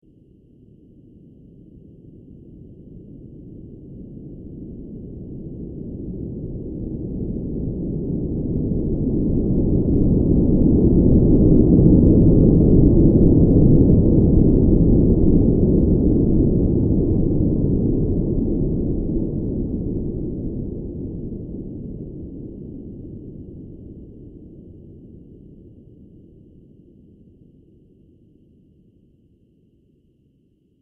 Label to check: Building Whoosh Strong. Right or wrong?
Right